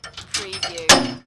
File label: Chain Metal Clanging